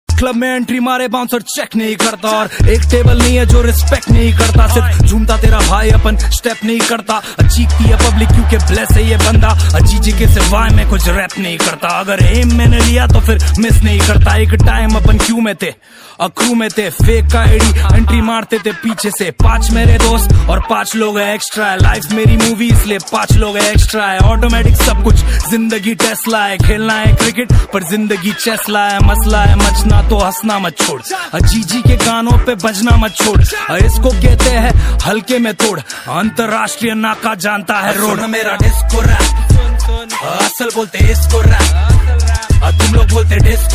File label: DISCO RAP